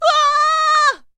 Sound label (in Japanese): shonen himei